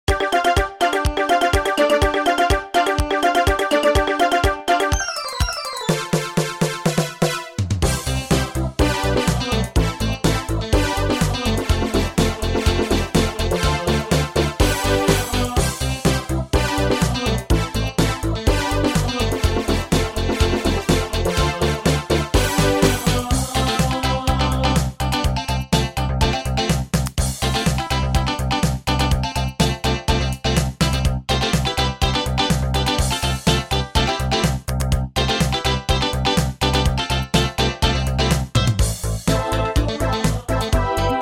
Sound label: CHA CHA, midi